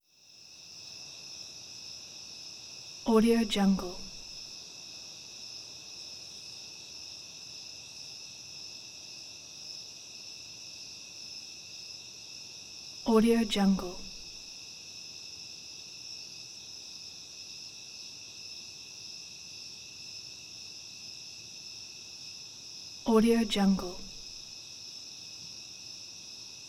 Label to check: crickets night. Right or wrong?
Right